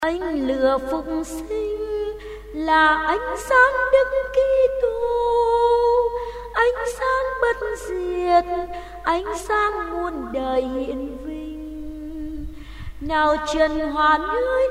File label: soprano tenor